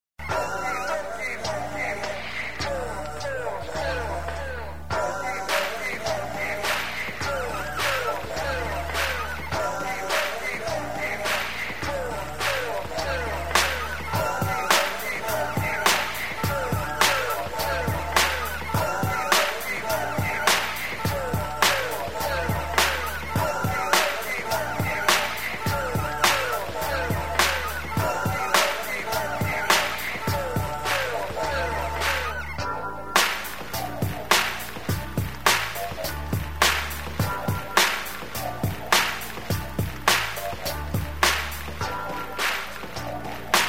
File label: instrumental